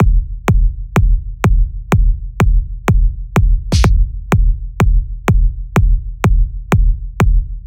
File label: Kick 125; Drums; Separated Drum Loops; Kicks; Ghosthack; Abstract Drums Volume 2; Drum Mega Bundle